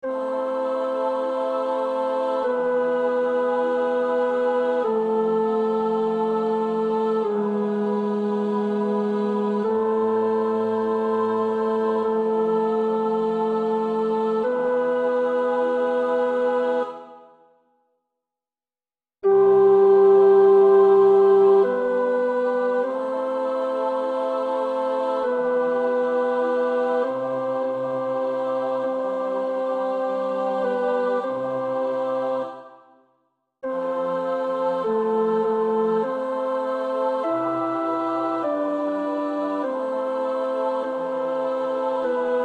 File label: tenor; Palestrina (Giovanni Pierluigi da); Adoramus te